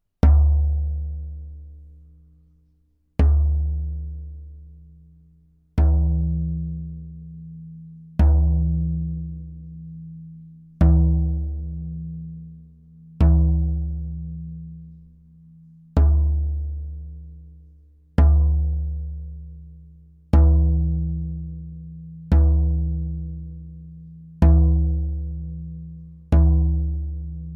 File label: after tuning